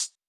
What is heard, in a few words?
hi-hat